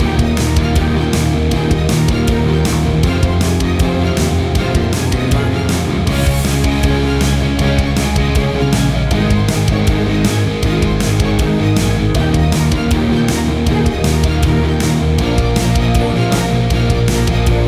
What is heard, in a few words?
Big Punk Rock Orchestrations